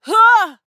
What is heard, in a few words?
falling